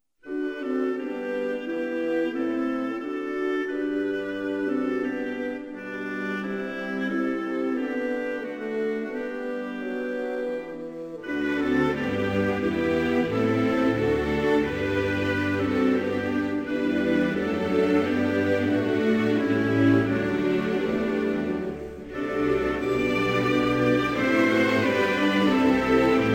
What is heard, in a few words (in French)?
Barbades, Hymnes nationaux